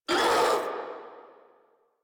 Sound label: Infested Charger Pain; Warframe